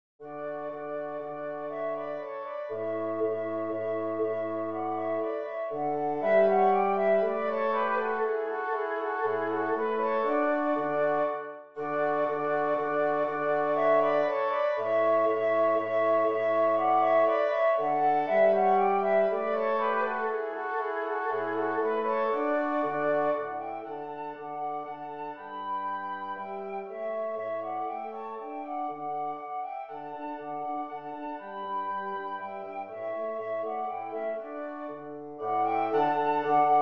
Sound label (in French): danse allemande, ré majeur; haydn joseph